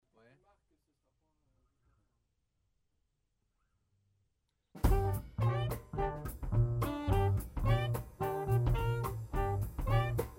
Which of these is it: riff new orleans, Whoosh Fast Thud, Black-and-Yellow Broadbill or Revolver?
riff new orleans